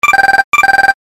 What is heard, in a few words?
Global Calling, ringtones; Fujitsu; F-08D